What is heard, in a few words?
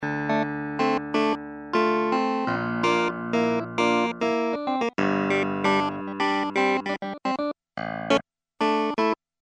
clavinet; numaconcert